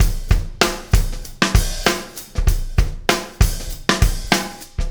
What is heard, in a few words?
SB Funk Rock